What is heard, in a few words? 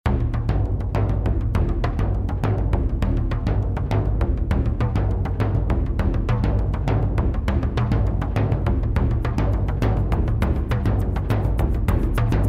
rhythmdust